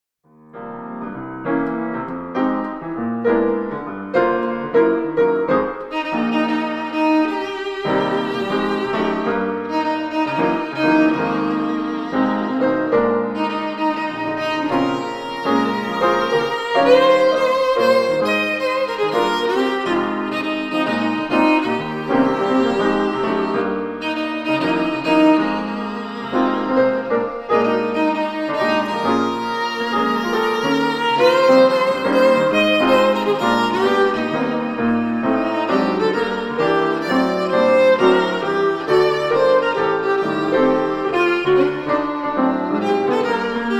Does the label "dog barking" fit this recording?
No